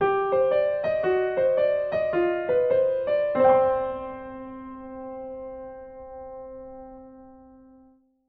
Piano Jingle

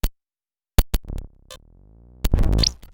Glitch; Aromatiq; Suonho

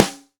Tight Snare

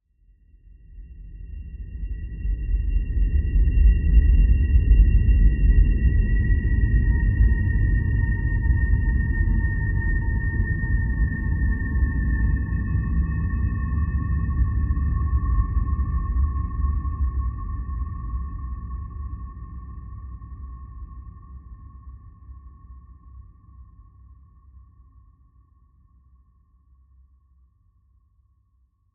An Eerie And Gloomy Transition, Em

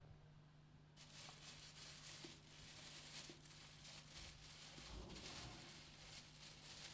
Paper rustling

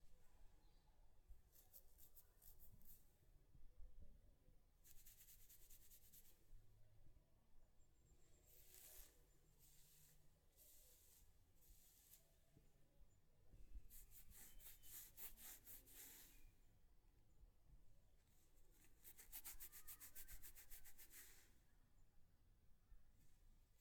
Scratching

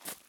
Footstep Gravel

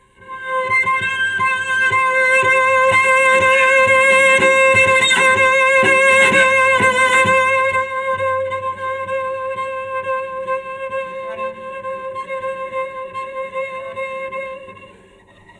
Cello Play C

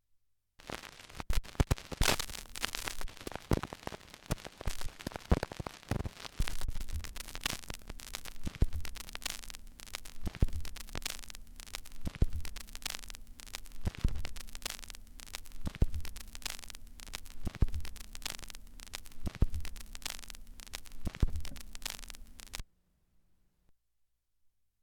Vinyl Dust

